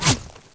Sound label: whizz; weapons